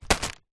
food potato chips crisps large bag set down on surface; zapsplat